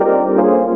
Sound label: Chord Duo; Jungle; Blu Mar Ten - Jungle Jungle - 1989 to 1999 Samplepack